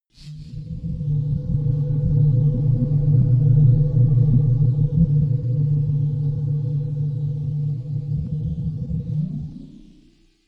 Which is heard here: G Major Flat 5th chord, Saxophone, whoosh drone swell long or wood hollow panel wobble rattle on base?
whoosh drone swell long